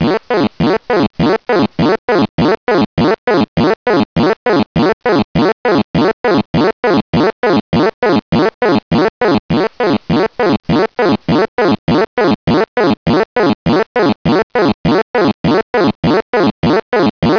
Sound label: pulse machine; ambience